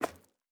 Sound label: Footstep Concrete Walking